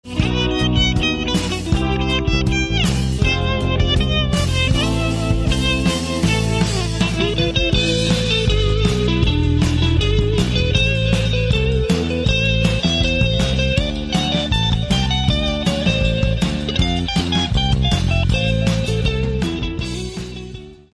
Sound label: room solo